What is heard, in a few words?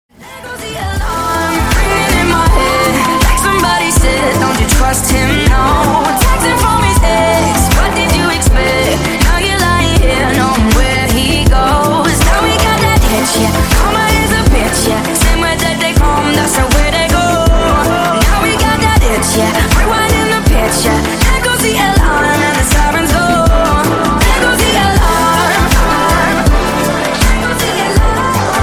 remix